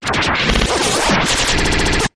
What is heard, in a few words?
Interference Stutter